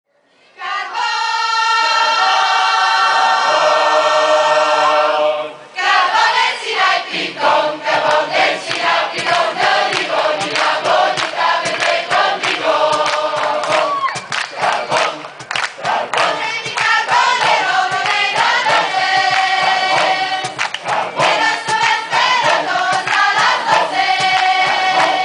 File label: Coro di Voci di Mezzo